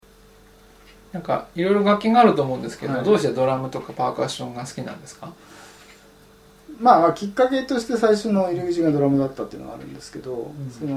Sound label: drum